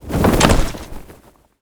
parachute land

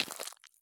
walk gravel; Escape from Tarkov

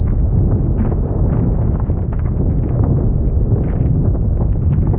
tank rumble; War Thunder

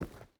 footstep rubber boots forest walk; Rust

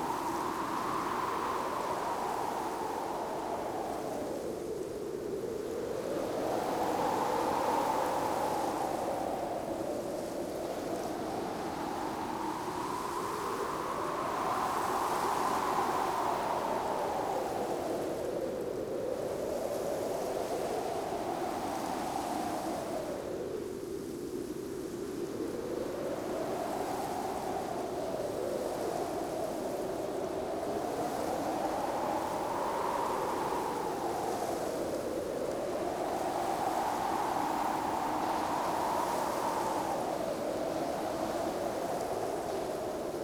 ambience arctic beach; Rust

